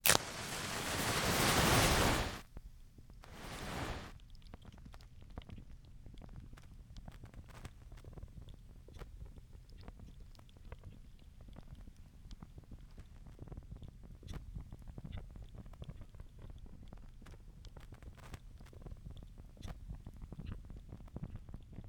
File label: Fire